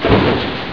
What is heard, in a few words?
metal; doom